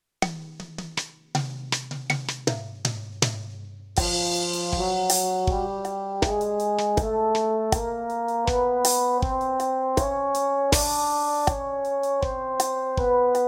Chromatic in F, brass; backing tracks

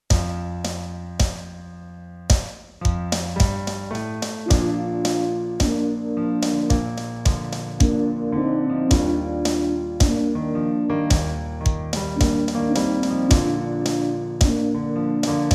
dotted crotchets, brass

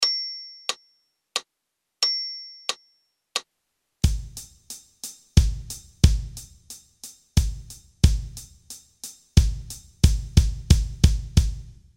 Slow waltz count in, brass, practice

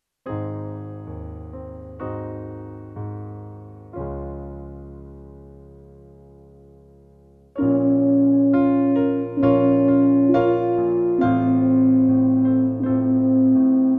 Brass Slow Tempo changes; holding a brass instrument; holding a brass instrument correctly

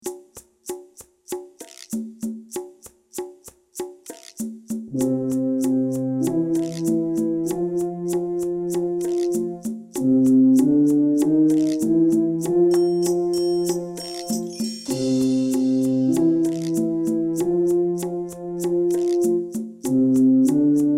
Find Bb; brass; backing tracks

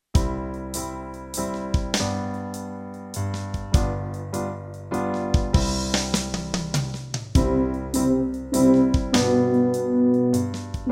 F mid

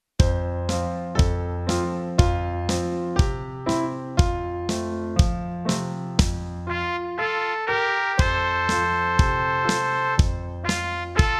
The Saints in F, brass; backing tracks

